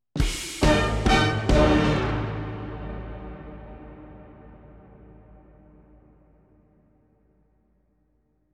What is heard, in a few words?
big band sting